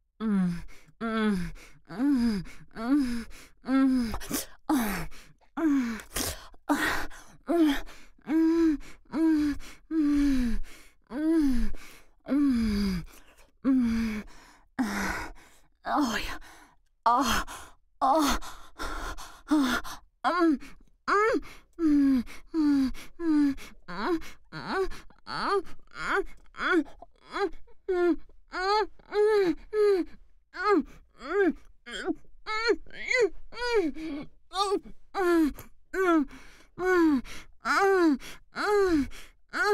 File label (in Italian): orgasmo femminile